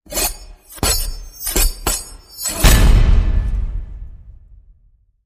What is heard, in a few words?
Slicing Hit